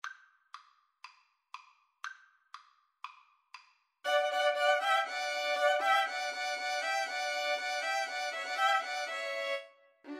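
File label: autumn 1st movement, 2 Violins Cello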